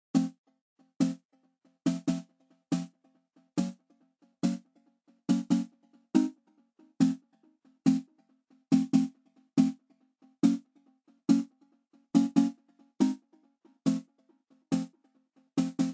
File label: Chords+Stabs; Robot